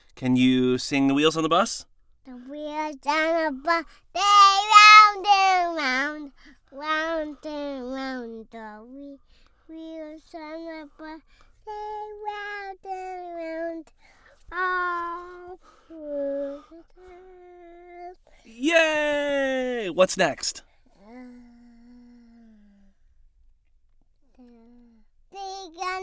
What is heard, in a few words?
Singing Wheels On Bus